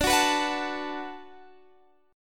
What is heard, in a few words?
D 6th D down Guitar Drop D, chord